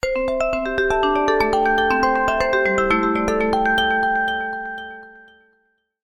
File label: Beautiful Arpeggio, ringtones; Infinix NOTE 30